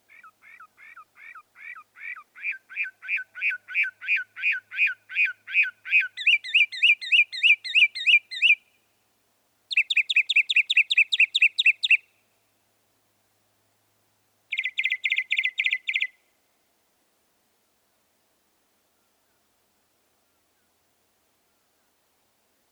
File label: Northern Mockingbird